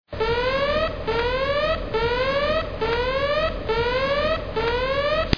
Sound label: alarme